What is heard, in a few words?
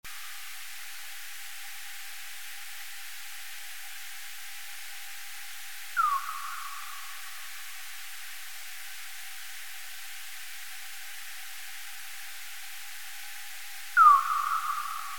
Tadarina australis